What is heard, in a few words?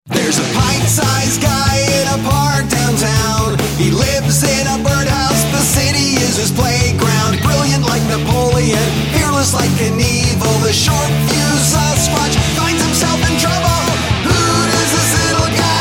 Singing